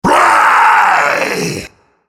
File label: spell cast effort, en US; Rengar